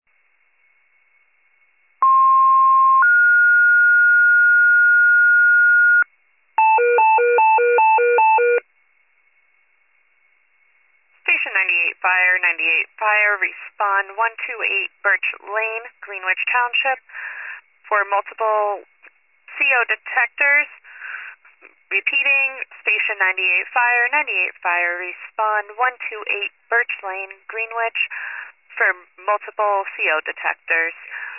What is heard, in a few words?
fire, pager alert